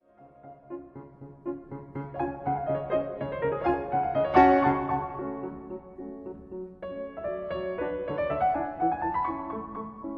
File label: Piano Sonata in B flat major Scherzo and Trio Allegro vivace; Piano Sonata No. 21